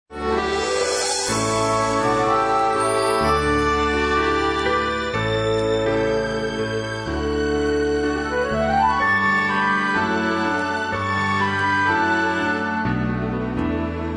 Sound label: a instrumental